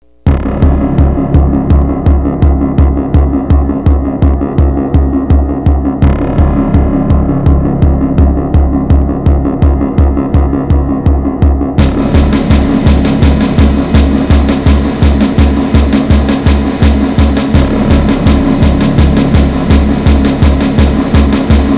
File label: music; musiques